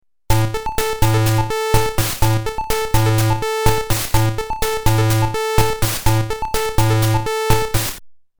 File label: pwm sound